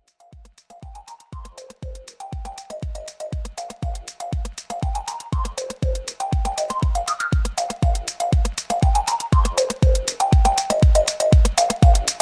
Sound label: white noise